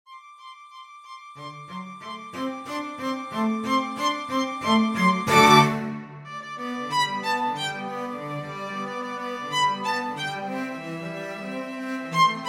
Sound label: Violin and Cello Duet; Jingle Bells